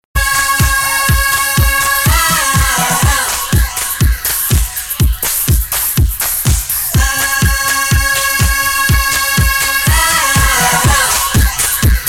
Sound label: classic house music